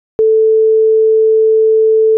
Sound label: lead silence